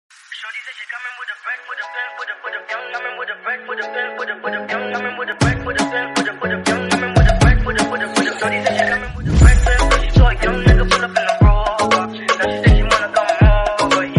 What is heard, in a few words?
remix ringtone